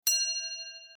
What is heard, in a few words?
ding; mekanta